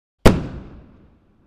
Fireworks single explosion shot

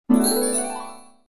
Spell of magic potion

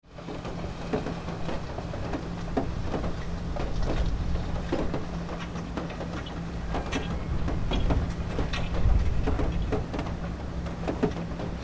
Ski lift in operation onboard chairlift ride

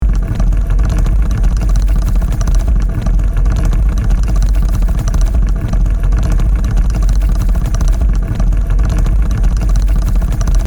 Machinery gears rattle loop; gfxsounds

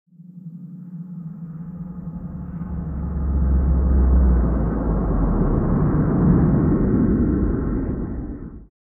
Cargo spaceship pass by

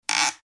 Old wood window opening or closing

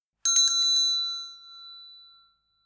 Convenience store opening with a bell ringing

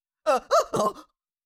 Cartoon little monster voice sigh surprise

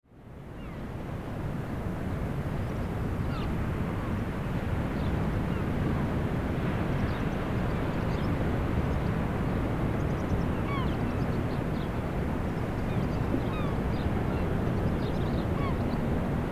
Beach ambience distant traffic seagulls and birds

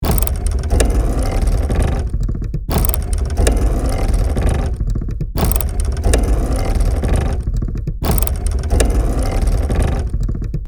Heavy industrial machine running loop

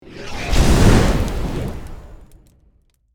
Fire magic spell short attack; fantasy

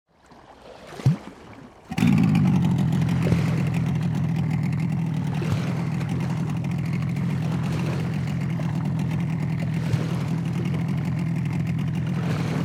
Motorboat starting up and idling

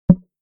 Electric guitar muted string

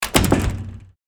Screen door close; household

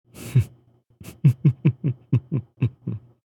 Evil laugh anime villain vocalization

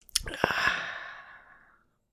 Drinking short ah

